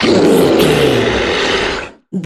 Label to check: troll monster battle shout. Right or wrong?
Right